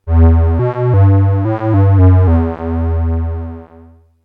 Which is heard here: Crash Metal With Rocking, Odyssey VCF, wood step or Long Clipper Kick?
Odyssey VCF